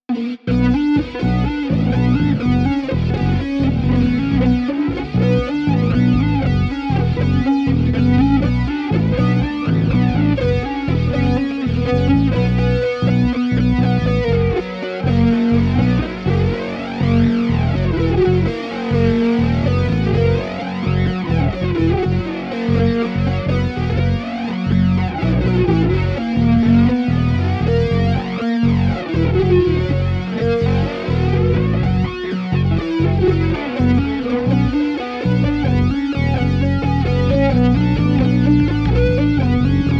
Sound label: sick riff